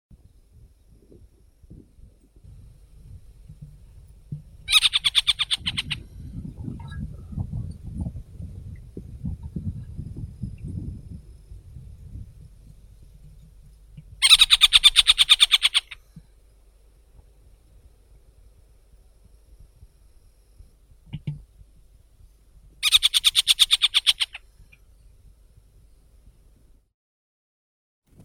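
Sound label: eastern marsh harrier, Circus spilonotus; lun bolotnii vostochnii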